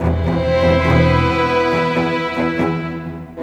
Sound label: Cinematic Strings, Orchestral; Sonic Foundry (Sony Creative Software); Orchestral Series VOL-3; Cinematic 19